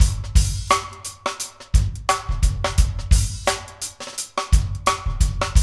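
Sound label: 84 bpm, Funky Drums; AMG; Now CD-ROM (Roland); Neil Conti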